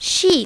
sheep

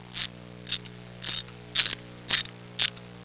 socket wrench; Sound Effects for Multimedia - Volume 1

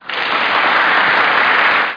applause; CDStarter 2.1.1

